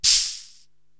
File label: HH Spray Can Open, Korg TR-Rack drum machine